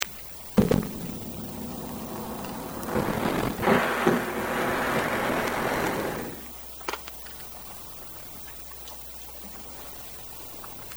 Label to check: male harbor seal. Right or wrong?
Right